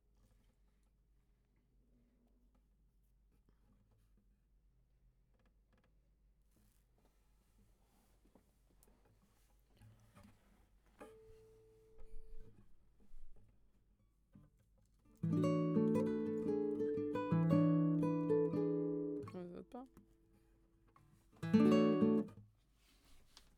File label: GTR